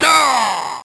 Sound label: death; quake2; ranger